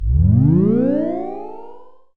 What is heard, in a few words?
Heal